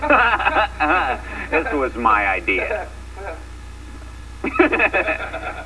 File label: laugh